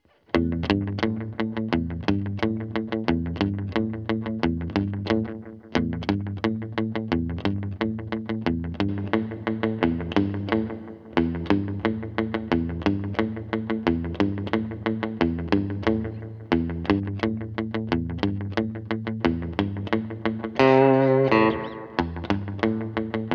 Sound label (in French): guitare; AKG BX20